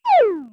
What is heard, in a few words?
enemy throw; SNES; SMRPG